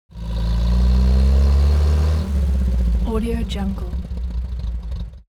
transportation car uaz 469 short acceleration idle